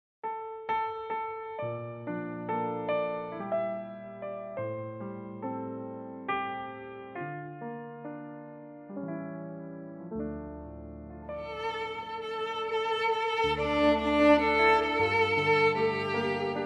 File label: Dm piano violin